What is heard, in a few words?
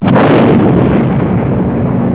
CANNON; Kiddie Ride Sound & Control; Tank